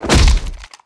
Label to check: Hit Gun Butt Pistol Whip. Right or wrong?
Right